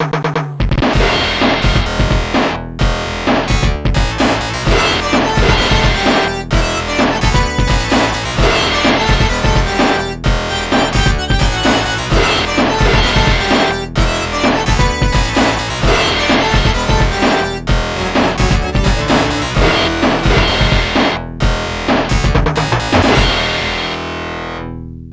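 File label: background music; Final Fight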